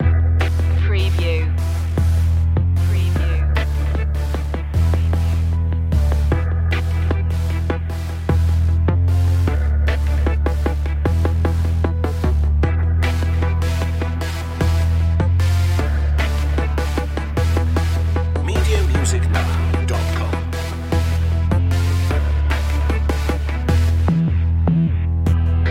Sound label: urban jungle no drums